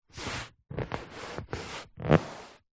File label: chair leather body movement; office